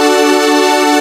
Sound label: B major Right; Angry Birds